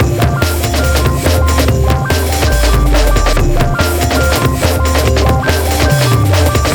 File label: Shake That Booty 142 Dmin, Hardcore Breakbeat; Big Fish Audio; Hardcore Breakbeat Action